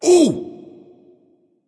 grunt hurt

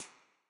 clap; smite; arachne skin9

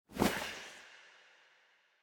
Clothes; smite; izanami

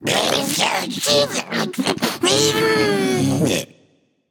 grumble; smite; ratatoskr

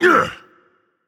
grunt attack, dragon; fafnir

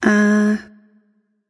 disagree light; smite; voicepack; hel

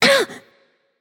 ouch light; smite; voicepack; hel

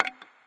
lobby victory; Smite; Zhong Kui

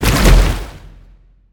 water activate; smite; god merlin

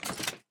armor; smite; god sobek

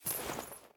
armor lite; smite; lancelot

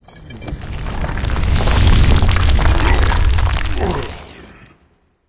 lobby landing; smite; geb

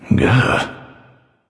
"ugh"; smite; voicepack; ullr

